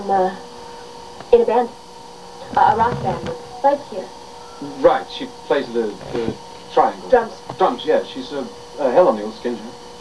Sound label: drums